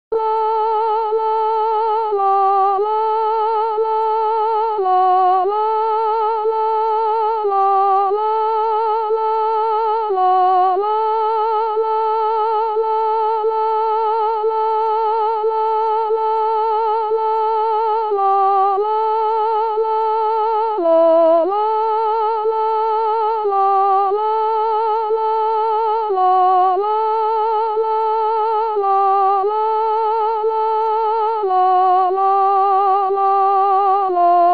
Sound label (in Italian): soprano